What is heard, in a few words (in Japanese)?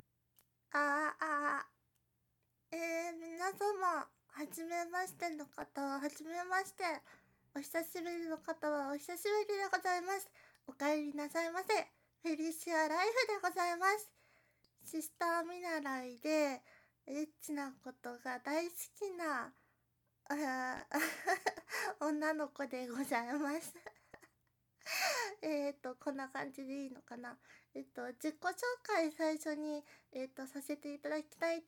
実演 ノイズ除去; 【リアルオナニー実演】じゅるじゅるバイブフェラ?!久々のオナニーでHな音が溢れちゃうノンストップおまんこ刺激音声